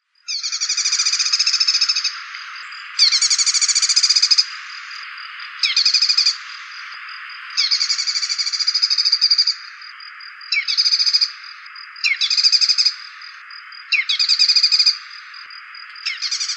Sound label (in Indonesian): cinenen belukar